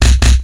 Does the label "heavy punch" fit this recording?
Yes